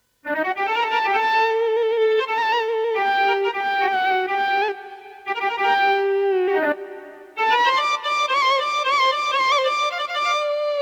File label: KAMAN; korg; EXB Solo Instrumente